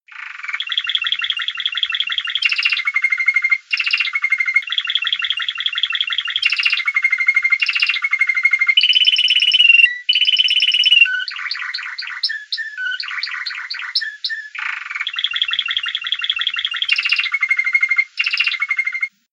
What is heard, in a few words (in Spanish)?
Duos; θετικές νότες